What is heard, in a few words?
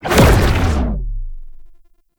Cloak Cast; Warframe; Frumentarius